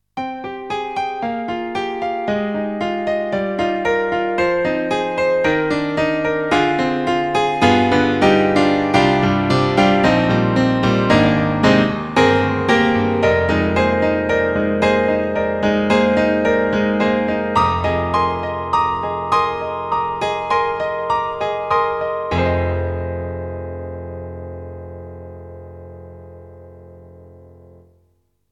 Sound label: Korg N1R GM Piano